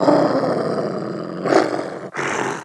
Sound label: growl; The Sum of All Fears